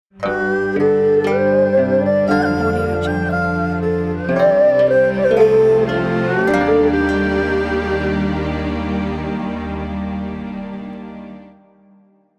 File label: singing erhu logo; ladanauskas